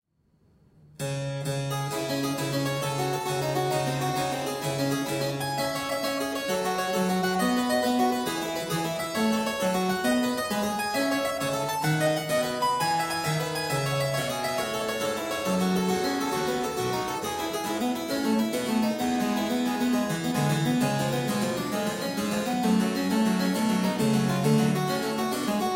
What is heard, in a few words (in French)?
Prelude en re mineur pour clavecin; BWV 851